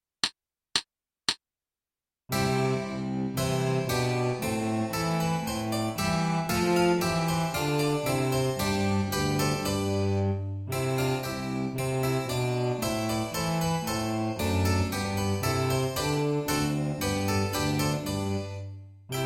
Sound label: petite gavotte - accomp only; Flute